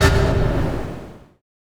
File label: shield bash miss; weapons; dota; mars